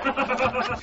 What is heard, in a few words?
laugh; goofy